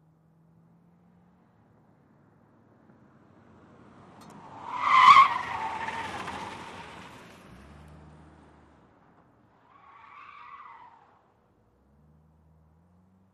Sedan Accel Corner

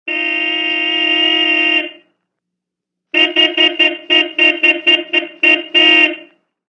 1940 Car Horn Small

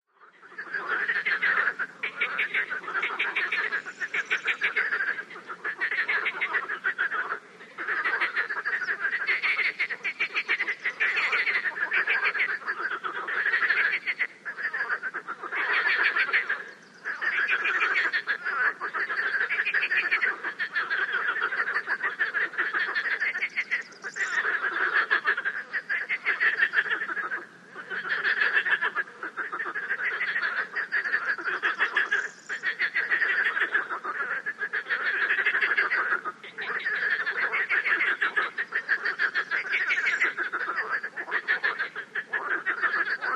Frogs Ambience

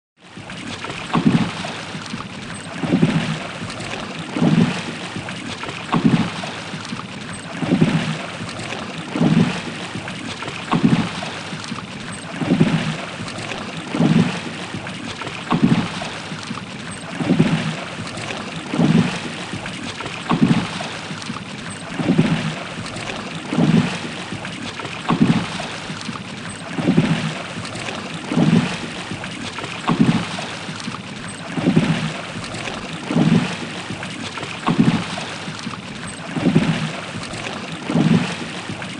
Boat Rowing Constant, rowboat & canoe

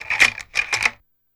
Slide Projector Single Change; movie & projectors

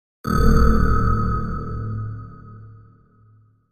Bell Pulsating Chime

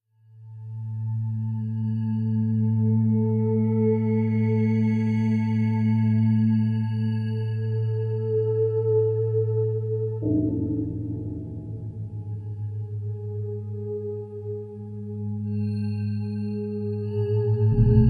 Moving Shimmer Tone, deep

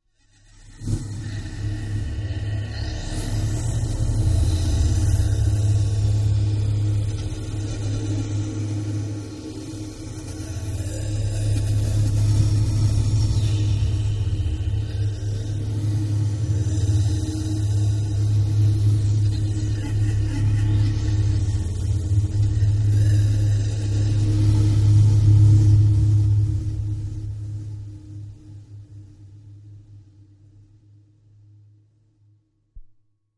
No Comfort Dist Low Elec, energy beams

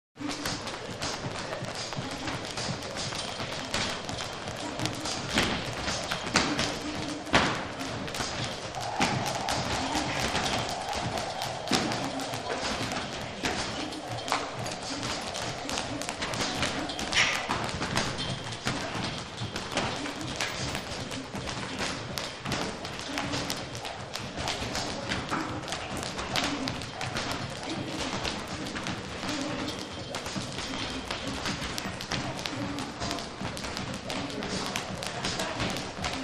Boxing Gym Ambience

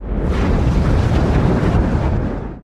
Dragon Fire Whooshes, No Crackle Element

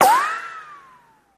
Bullet Ricochet Ban, riccos

